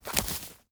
Keep fish sound; UltimateFishing2020

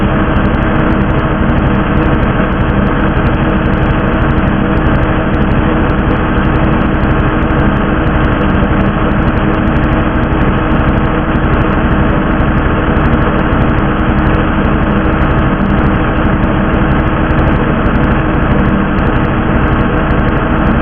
Submarine; Engine; nwh dynamic water physics

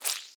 Blood and Gore Juicy Squish Squirt; UltimateFishing

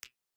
on click; UltimateFishing2020

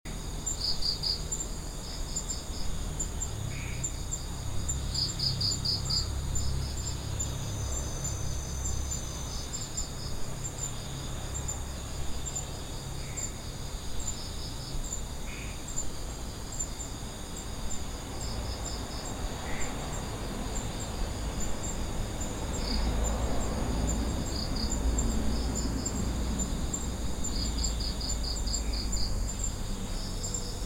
Balinese City Night Ambience Loop; UltimateFishing2020

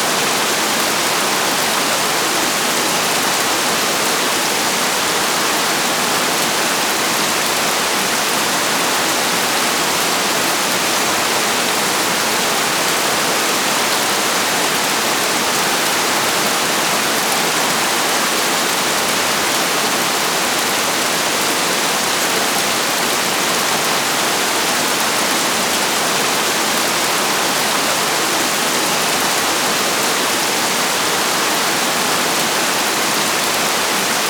Heavy Rain; UltimateFishing2020

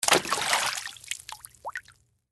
water canoe paddle insert; UltimateFishing

